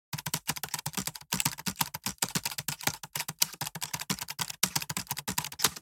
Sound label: Keyboard Typing